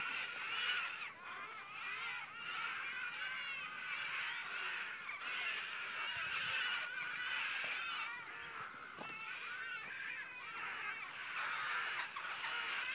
about 40 crows mobbing a sparrowhawk that had taken a magpie in our yard